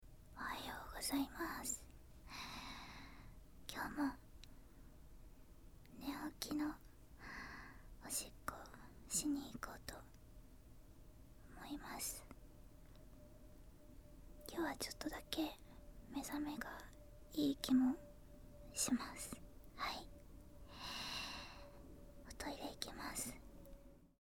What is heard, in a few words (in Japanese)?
朝起きておしっこへ; 寝起きおしっこ11日分